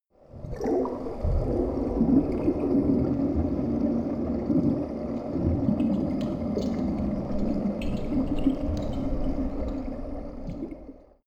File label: water fx